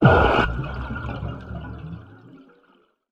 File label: burst; sprunki brut pack